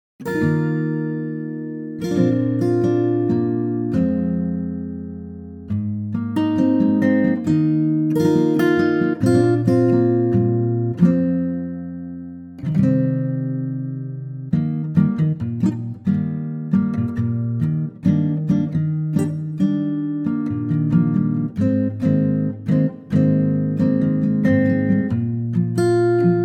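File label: Eb Ac Guitar, male; key - Eb - vocal range - Eb to C; Lovely acoustic guitar only arrangement; intimate 8's feel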